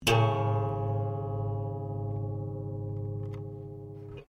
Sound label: ZITHER TWANG, Very old wooden zither or autoharp; Strings; Musical